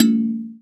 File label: KALIMBA; Spectrasonics; Heart of Africa; KALIMBAS